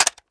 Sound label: aug clip in, weapons